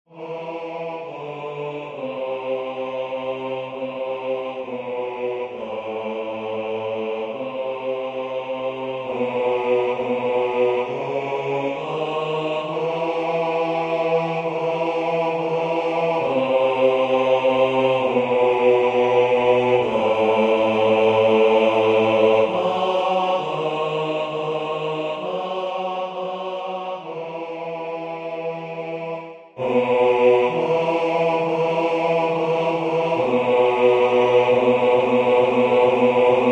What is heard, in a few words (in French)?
Je vous aime o mon Dieu basse